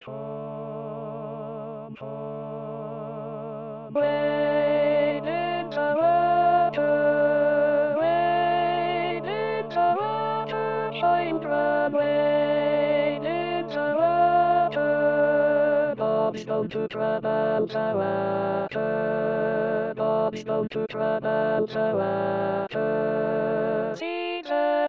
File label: Alto, Wade in the Water, Gospel